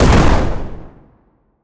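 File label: Kamikaze Explosion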